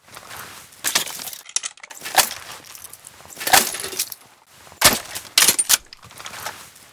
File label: reload empty; tacz; RFP v1.0.0 beta2